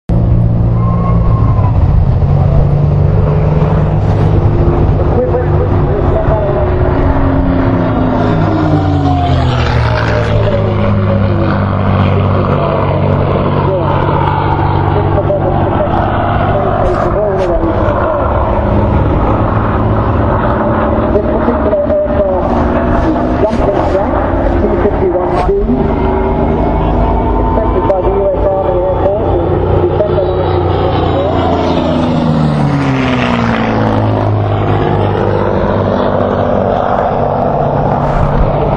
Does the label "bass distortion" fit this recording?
No